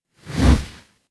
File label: transition swoosh